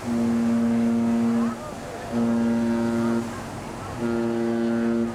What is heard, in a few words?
horn; beaver island